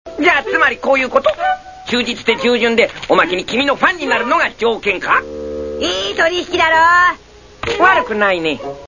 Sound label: pluck